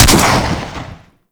Swarm Missile Launcher Fire 2D Shot; Warframe

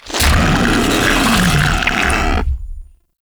Ancient Tank Attack, Enemies, Infested, Barks; Warframe

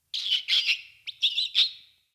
Witch Station Rat Chatter; Ambience; Soul Plane

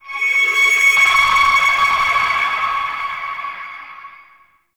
Tonal Nightmare, Ambience; Warframe; Shadowgrapher

